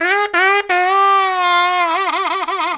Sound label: Trumpet Noise; Traditional; Sonic Foundry (Sony Creative Software); Jazz Solos and Sections